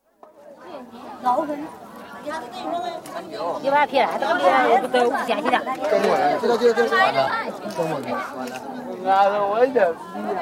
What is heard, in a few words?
Wuhan: Vegetable market (rural area near Wuhan); China; Outdoor Sound Effects; BBC